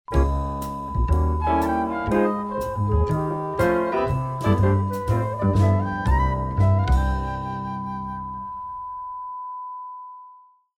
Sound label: groovy jingles